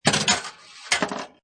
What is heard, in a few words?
Pinball Sound Ball Launched Rolls And Clanks; Video Game; Games; Lynne Music